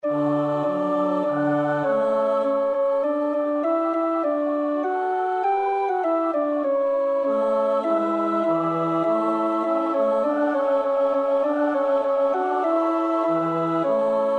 tenor, koorpartij oefening; Wolf (Ernst Wilhelm); Jesu deime Passion

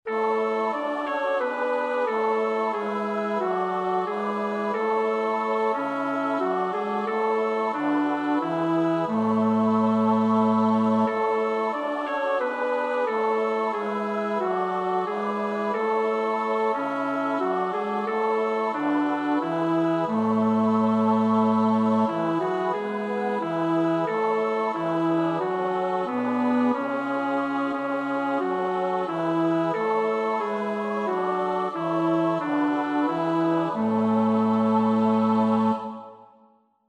bas; Bach (Johann Sebastiaan); BWV 067

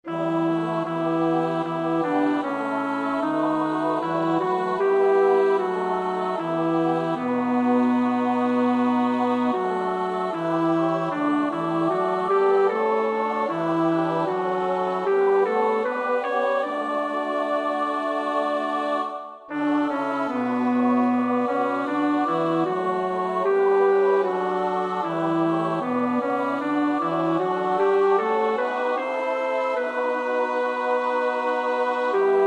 bas, koorpartij; Bach (Johann Sebastiaan); BWV 121

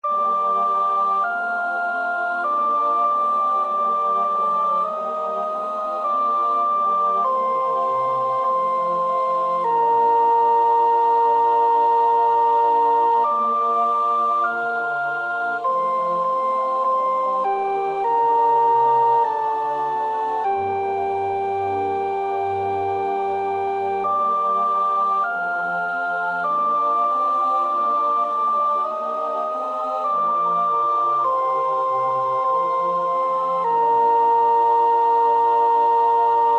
sopraan; Bach (Johann Sebastiaan); BWV 010; 7.Lob und Preis sei Gott dem Vater

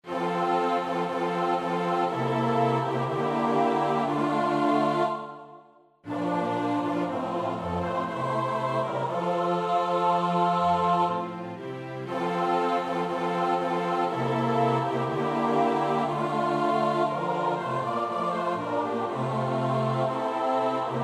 S-A-T-B, koorpartij oefening; Schubert (Franz); Deutsche Messe (D872)

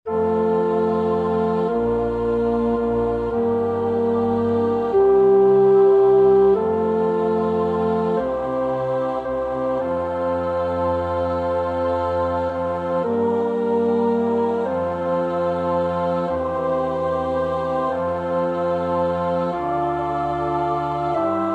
tenor, koorpartij oefening; Dubois (Théodore); Messe Brève

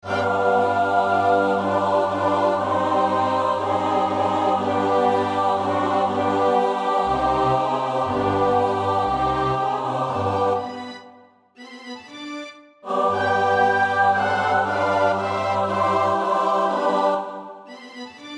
S-A-T-B, koorpartij oefening; Mozart (Wolfgang Amadeus); Mis in C (Krönungsmesse) KV 317

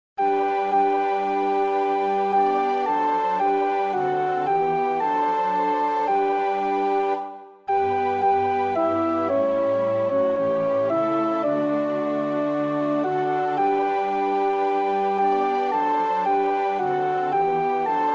alt, koorpartij oefening; Schubert (Franz); Deutsche Messe (D872)

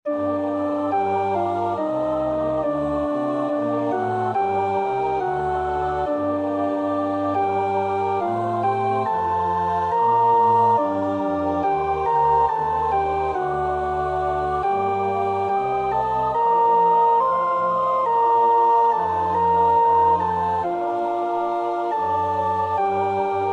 alt, koorpartij oefening; Bach (Johann Sebastiaan); BWV 006; 6.Beweis dein Macht, Herr Jesu Christ

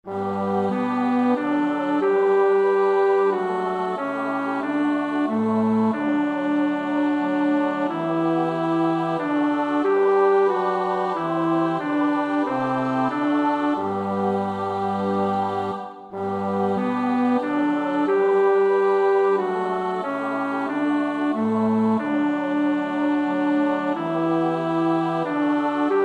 bas, koorpartij oefening; Bach (Johann Sebastiaan); BWV 070

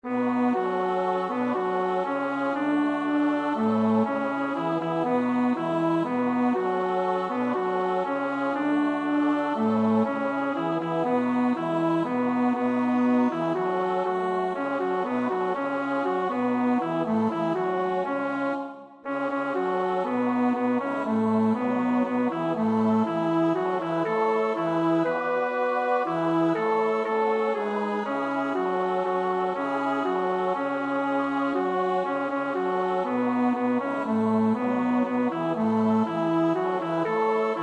bas, koorpartij oefening; Willaert (Adrian)

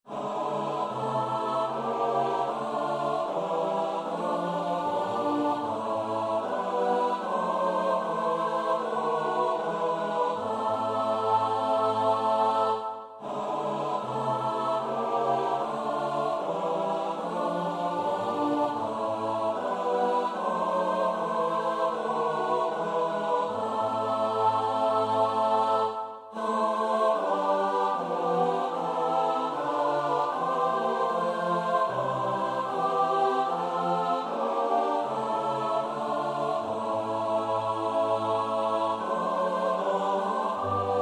S-A-T-B, koorpartij; Bach (Johann Sebastiaan); 5 Und ob gleich alle Teufel

